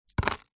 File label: money coins x2 australian 2 dollar coins set down on plastic lid; foley; zapsplat